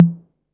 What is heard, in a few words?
808 TME, TR-808